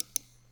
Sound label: Click Long